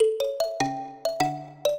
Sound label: minuet, mbira; Mozart